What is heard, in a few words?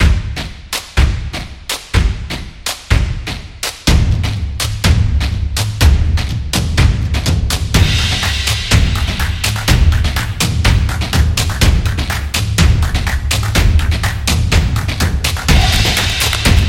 Percussion